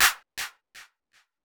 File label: FX, ambient, 160; eJay